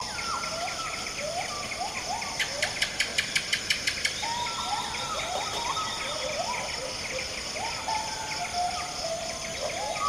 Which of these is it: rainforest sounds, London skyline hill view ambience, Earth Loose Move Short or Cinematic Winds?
rainforest sounds